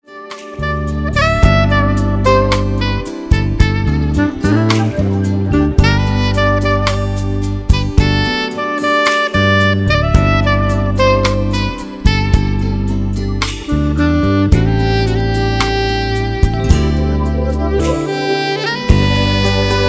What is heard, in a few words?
jazz